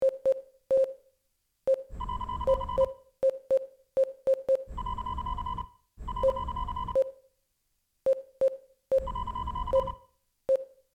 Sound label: tactical beep sequence, computer